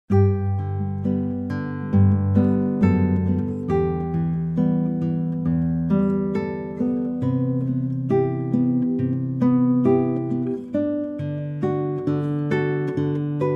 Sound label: Guitar